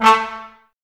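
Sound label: brass trombones mute; Miroslav Vitous; Woodwind Brass Ensemble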